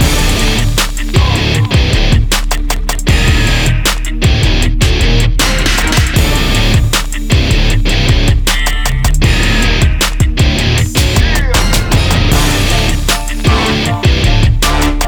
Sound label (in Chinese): 结算背景音乐; gamerip; Wild West Shootout